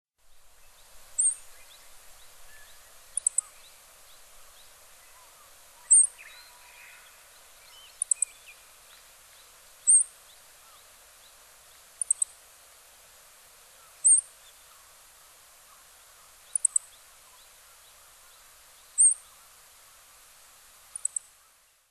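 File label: hoojiro, Meadow Bunting; hyogo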